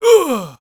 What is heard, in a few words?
Screams & Shouts human male; SoundBits